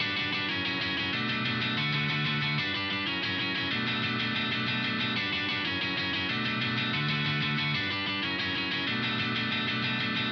EG Chord Session Guitarst

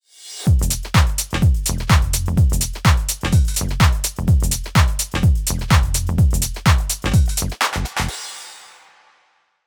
clap

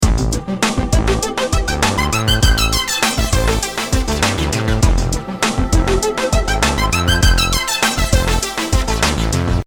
automation korg gadget

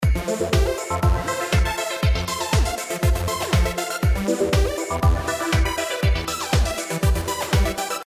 synth lfo filter

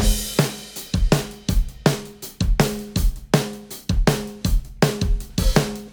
drumbus before; Smasher

